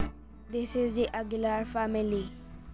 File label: Is Calling Rap, ringtones